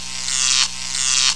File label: electric